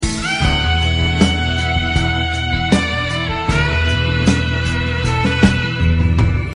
saxophone

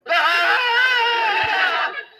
screaming

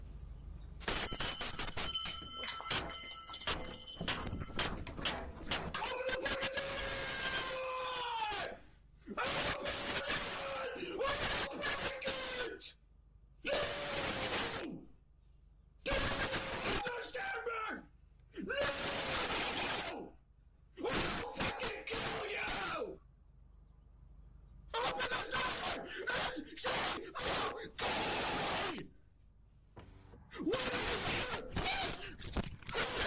doorbell

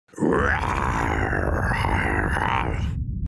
raaaaaar

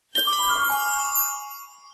future chime short

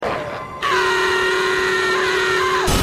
yell; courage

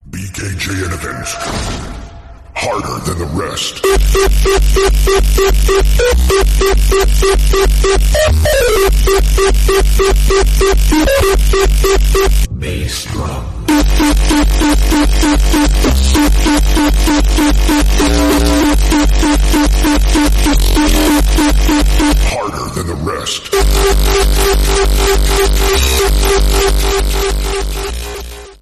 terrorcore horror

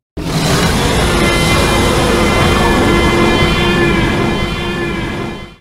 roar close

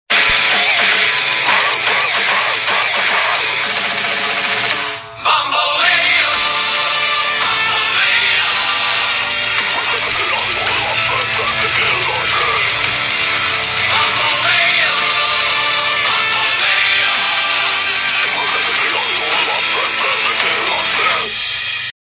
metal

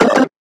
power activate; nano

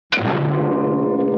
big brong

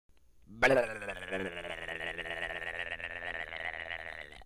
blerghghghgh

